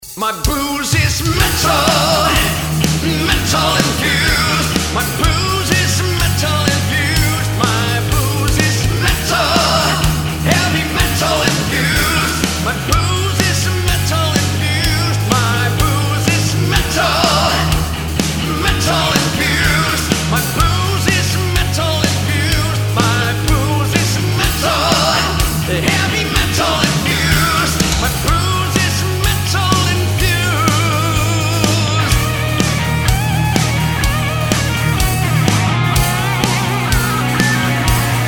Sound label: metal infused teaser